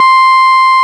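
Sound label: RMI piano C 5, EP; Sonic Reality - Vintage Timetraveler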